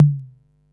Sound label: LOW CONGA, drum kit; Polyend; Stazma & Concrete Collage; Concrete-55